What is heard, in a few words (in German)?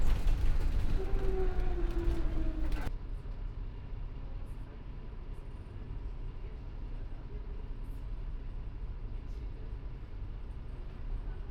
Im Elektrobus, Brasilien